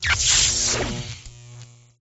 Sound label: fish shandian